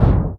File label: WHITE SOUP, PERC; NorthStar - The Wizard VOL-2; TSAR PERC